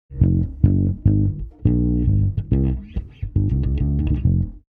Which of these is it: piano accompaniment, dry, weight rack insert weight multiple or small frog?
dry